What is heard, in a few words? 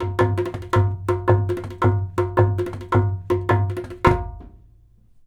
DHOL, Indian Traditions; USB Soundscan vol.45; AKAI